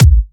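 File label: Bassdrum, right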